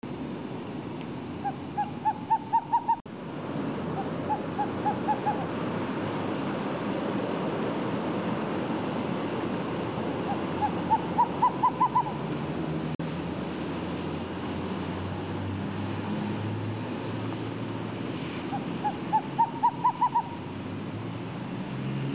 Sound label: birds screech owl